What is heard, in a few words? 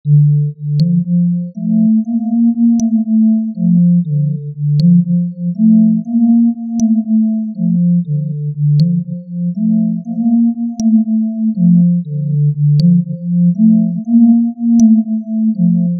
synth bunka